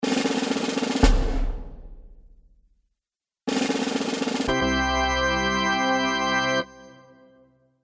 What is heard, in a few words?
Drum Roll With Fanfare, cartoon music